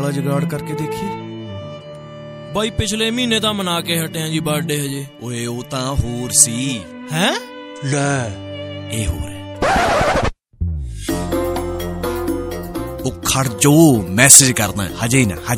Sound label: Punjabi Ringtone